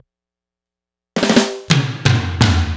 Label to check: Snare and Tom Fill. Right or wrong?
Right